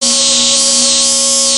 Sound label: buzz